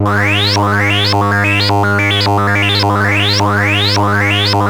WARP C3; OBMX-SYNTHS

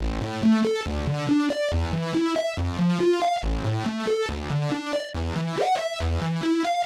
synth tekno 140 A; Techno2; Keys

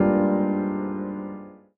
A Keys, One Shots; Keys & Synths; Touch Loops; BlissedOutBeats

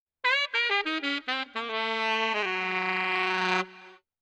OLDSCHOOL BRASS 96

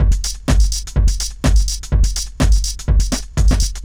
DEEPHOUSE BEATS 125

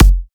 kick, drum hits; Anthemic

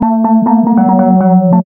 TECHNO SYNTH 140; Keys

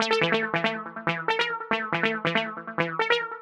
ELECTRO SYNTH 140 A; Keys

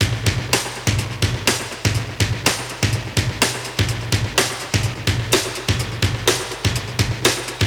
125 Classic Breaks, 125; Touch Loops; ClassicBreakSessions

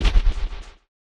Hat

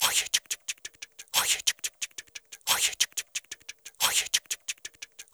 ETHNO EXTRA 90; ethno2

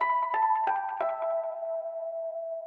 ethno, 90, D

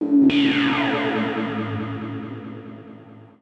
KEY SHOT TEKNO 140 A; Techno2; Singleshots

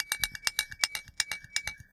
bottle, ethno, 125

